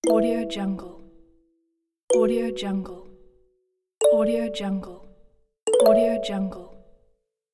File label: button menu; fx-market